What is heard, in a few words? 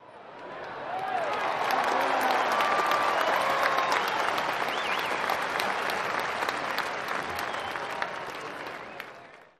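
Applause Cheering Whistle, large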